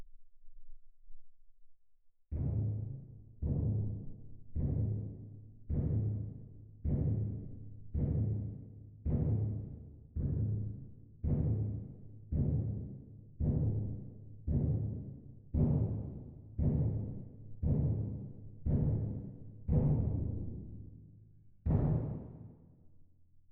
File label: Perc